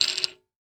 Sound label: Technology Electronic Light 650 Watt Fresnel Light Knob Spinning Lose Short